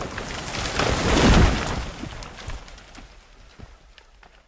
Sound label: Falling Tree; Zomboid 41.78.16; Project Zomboid